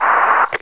radio

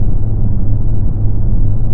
electric, engines; stunt rally

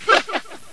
pain

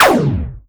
particle; endless sky

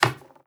dice put down

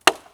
chop; edgar

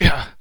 pain

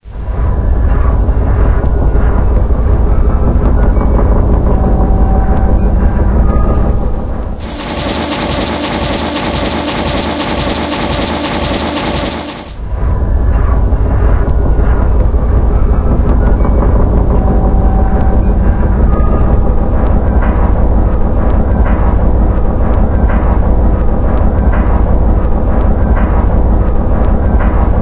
train; race into space

